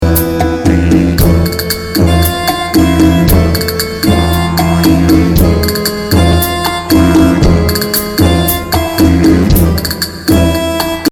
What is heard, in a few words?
Cafe Tango